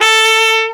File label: cornet lips A4; AKAI S-Series CD-ROM Sound Library VOL-2